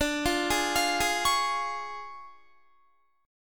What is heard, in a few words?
D Minor Major 7th Flat 5th, down, Guitar; DmM7b5 arpeggiated; JGuitar